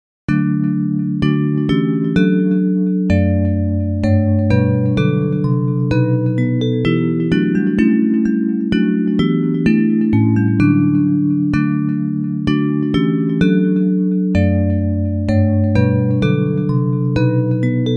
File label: Bells